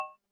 shield block; Character Actions

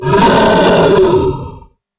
dragon roar; Creatures

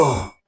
hurt male; Character Voices

